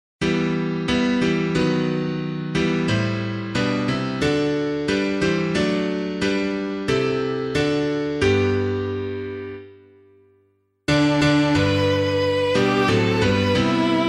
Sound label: Who Can Measure Heaven and Earth, LUCERNA LAUDONIAE, piano, hymn